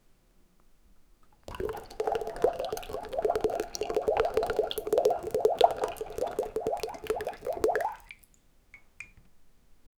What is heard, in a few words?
sound of a person in, bathroom